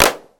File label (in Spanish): aplaudir